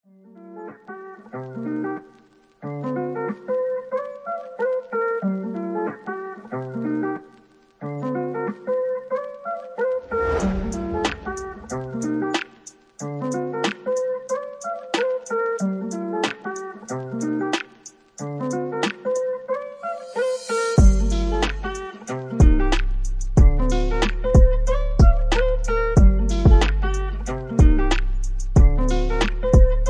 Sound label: beats ringtone